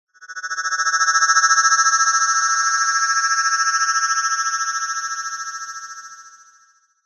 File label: rising stutter; sound design; zapsplat